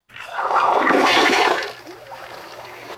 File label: dolphin exhaling through blowhole with water splashes; animals